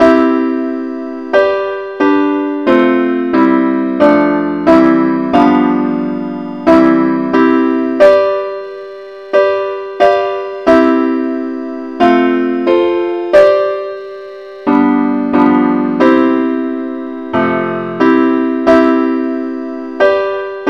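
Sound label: advance Accompaniment key C; Gwalchmai; Glory be to God on high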